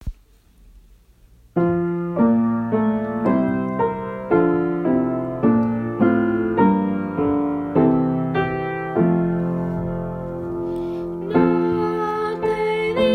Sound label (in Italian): notte di luce soprani